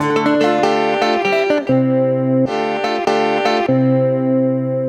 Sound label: Ac 12 Str Gtr 098bpm D, Twelve String Guitar, African Guitars; Quazibeat - African Secret